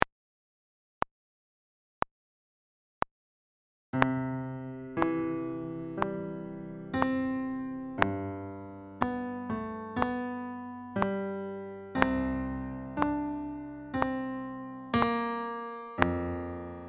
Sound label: duet acc